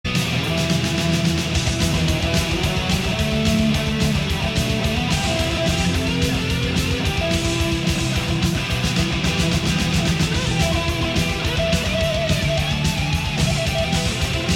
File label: solo